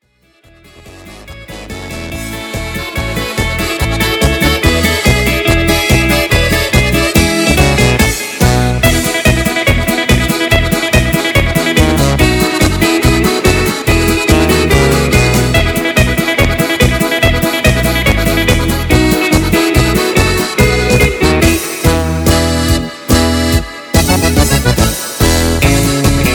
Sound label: Line Dance Polka